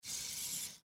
Sci Fi Servo Motor Small Robot; Servo Motor Small Robot Forward

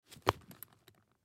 Camera Case Up, Camera Case Pick Up Zipper Wiggle; Digital Olympus Camedia C720; Cameras; Blastwave

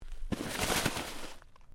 Body Movement Snow Lift

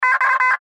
Radio Morse Code; Morse Code Weak Signal Letter X; Morse Code Sound Effects; Blastwave; Warfare

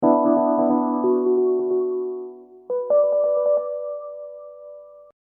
Hip Hop Dark Rhodes Licks 90 Bpm Swing E

